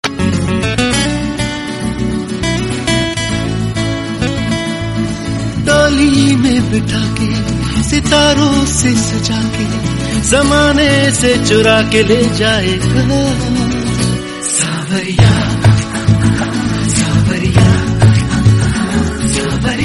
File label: cool Bollywood song tone ringtone